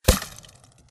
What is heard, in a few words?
imp bullet lightballistic impactsweetener; Titanfall 2